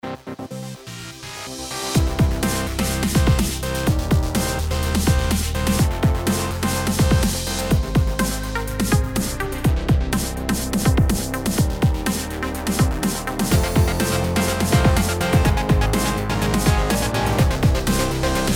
gaming intro

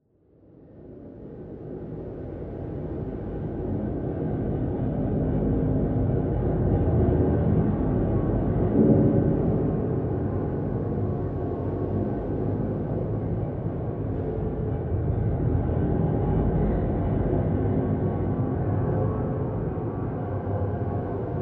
industrial chasm

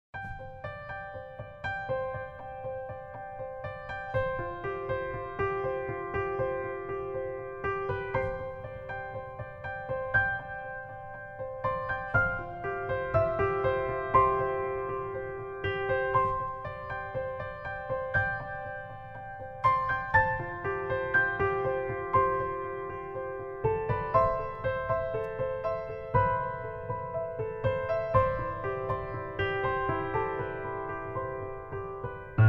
emotional encounter

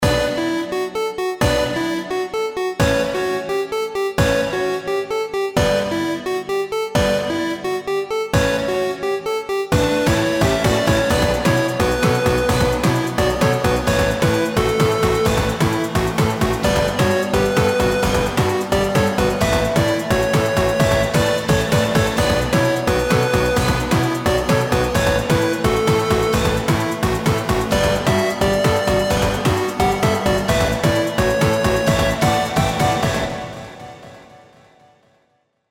8bit adventure

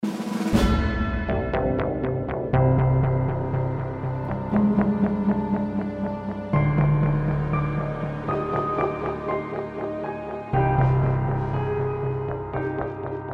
defeat and bittersweet

